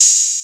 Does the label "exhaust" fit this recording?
No